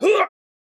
man injured grunt; soundscrate

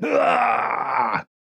man injured scream; soundscrate

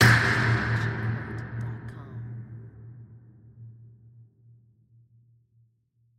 designer medium and scream hit; SoundsCrate

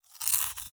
chew; soundscrate

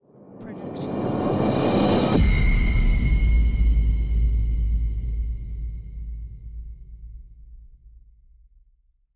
cinematic rise hit; soundscrate

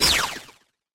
Anime Super Laser

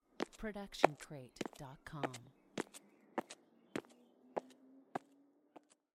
walking outdoors ambient; soundscrate

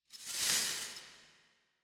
sweeping glass; soundscrate

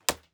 archery foley arrow impact

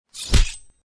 Knife Stabbing; soundscrate

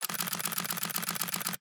Camera DSLR Shutter Burst

